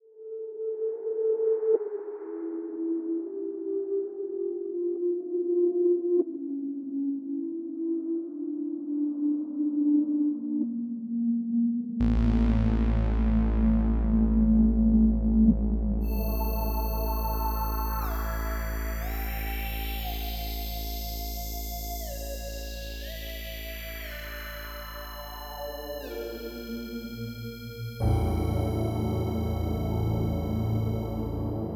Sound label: adagio allegro